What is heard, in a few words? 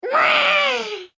Horror Scary Baby; Scary Soundscape Sound Effects